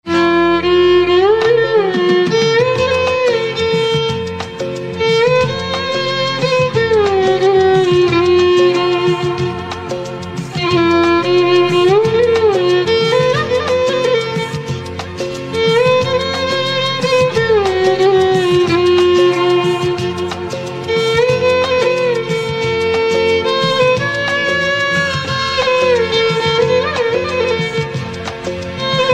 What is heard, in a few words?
Violin